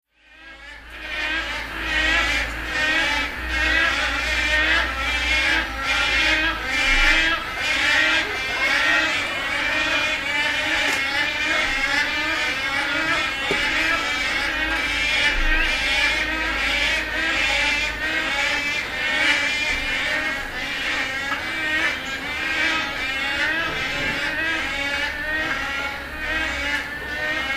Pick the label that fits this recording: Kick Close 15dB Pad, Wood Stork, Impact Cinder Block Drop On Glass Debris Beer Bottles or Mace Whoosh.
Wood Stork